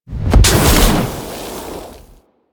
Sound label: Heat Beam Fire; Enemies; Abilities; Warframe; Corpus; Arachnoid Camper Terra